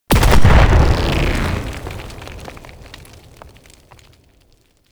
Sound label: Venus Unicycle Explosion